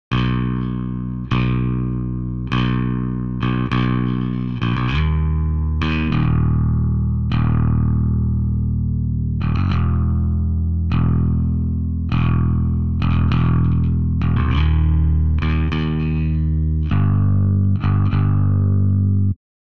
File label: Bass Limit Extreme; Domino